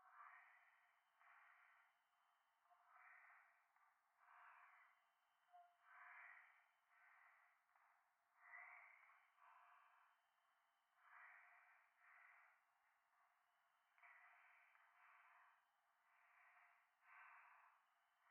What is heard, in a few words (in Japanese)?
右耳 密着 吐息 山奥; 夢の中